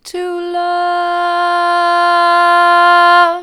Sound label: to love mid, vocal